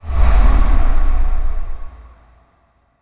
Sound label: teleport; sora; aragami 2016 gamerip